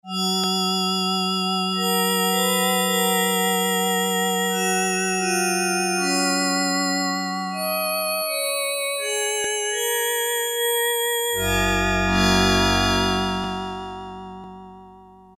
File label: Bowed Bell, Yamaha TX81Z